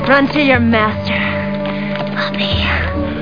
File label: puppy